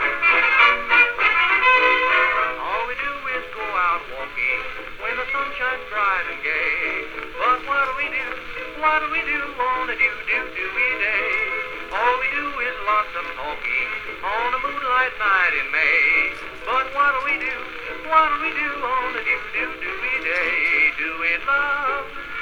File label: Victor V Wooden Horn; Victor V with wooden horn